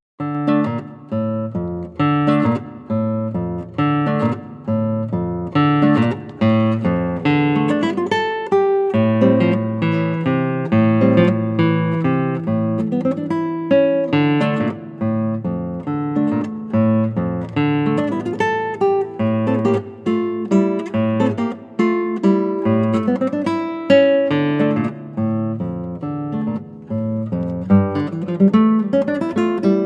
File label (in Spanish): Tango en Re